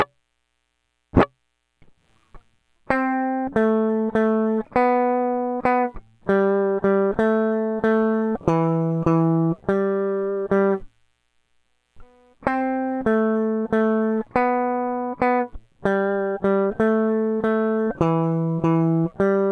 at 60 bpm

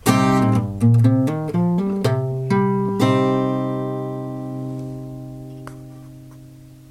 a shape minor embellishment lick c

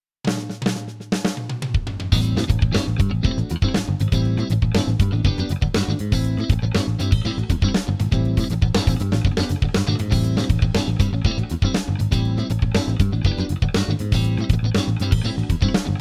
dorian funk, guitar

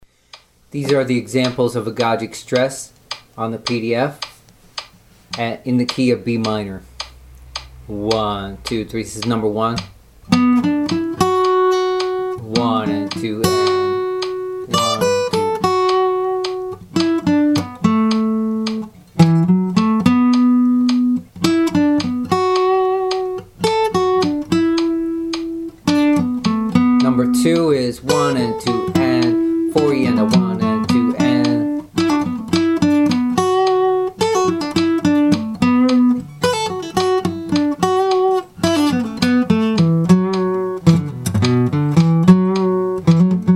agogic accents examples

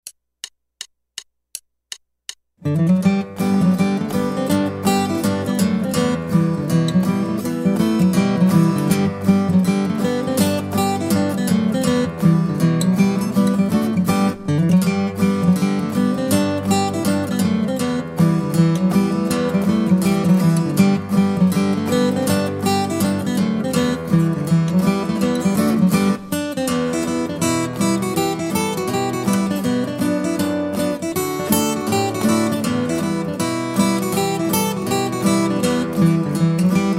Guitar

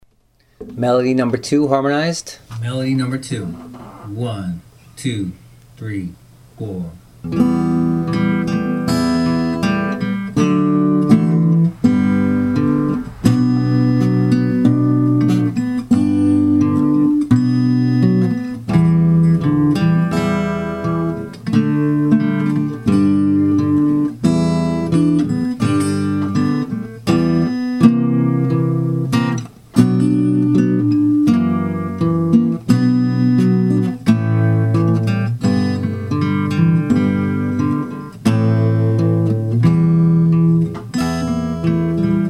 melody harmonized

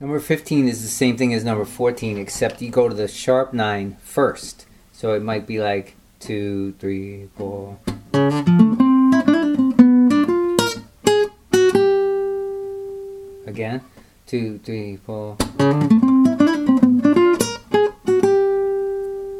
jazz licks

